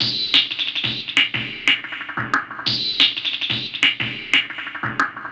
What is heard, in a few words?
Killer Beat, Drum & Bass, Beats & Breaks